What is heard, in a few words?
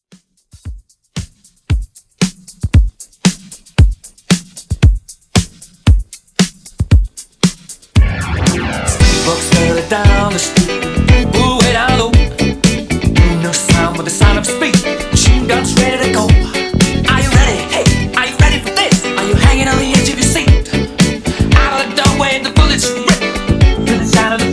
Redrum 115